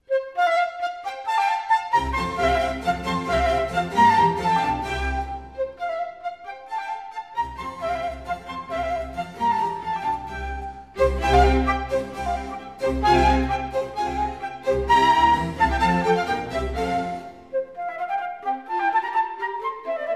Concerto C-dur for Flute, Strings & Basso Continuo, Allegro; Antonio Vivaldi; Famous Flute Concertos